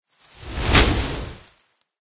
lobby victory tree; smite; kukulkan

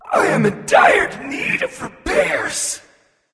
death; smite; voicepack; mercury

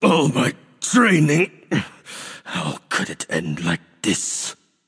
death; smite; ares

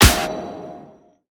Hit; Smite; god Izanami

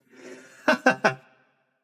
laugh; smite; janus

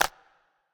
kraken bite; smite; poseidon

